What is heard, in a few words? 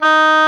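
D#4 hard straight, oboe; Northstar - New Gold VOL-2; EBONY OBOE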